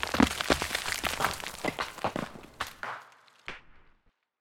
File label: cliff stone falls